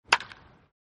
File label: Baseball Hit With A Wooden Bat Ringtone